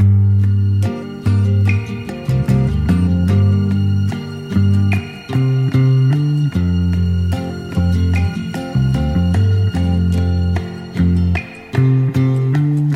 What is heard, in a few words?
guitar lazy, Loops